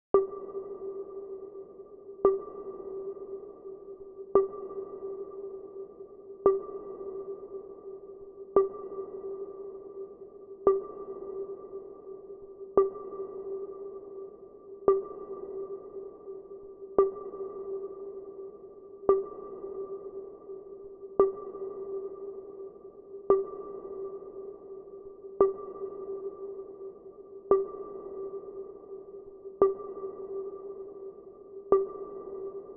114 BPM E Min Tone; Loop Stems; Cymatics; Obsessions

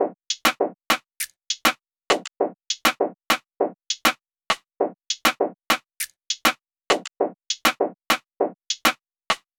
Percussion Loop 100 BPM; Hip Hop

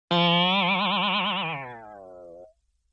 Boing Box Short Hit; Vintage Cartoon; Hits & Tones

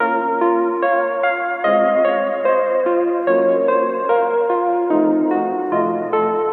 Nostalgic Melody Loop 147 BPM A# Min; Cymatics; 2020 Melody Collection

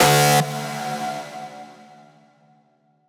synth screech oneshot D; Synth Hits; GhostHack

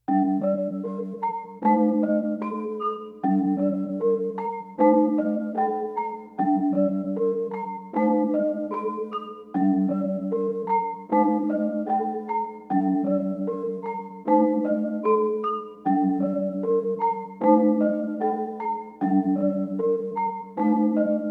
Dark Melody Loop - 152 BPM D Min Bell; Loop Stems; Cymatics; 2020 Melody Collection

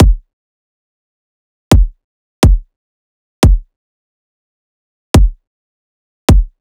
Riddim Drum Loop 140 Kick

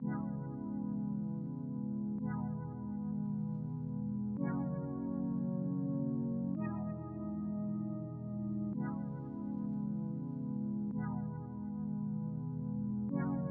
organ, 110 BPM D# Min; Cymatics; Oxytocine

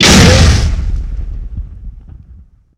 Explosion Punchy Impact

